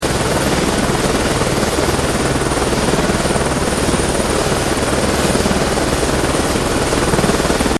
Helicopter Close Idle Loop, Close up of helicopter hovering